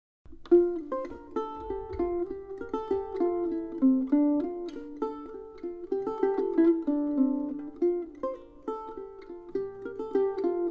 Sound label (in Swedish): Monicas Slängpolska melodi i F och G